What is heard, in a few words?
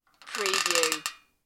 metallic bed squeak